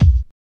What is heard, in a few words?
Hip Hop Kick